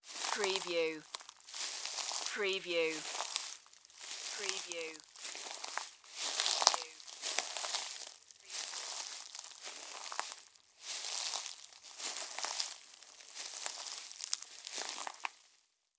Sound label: Footstep Hay Walk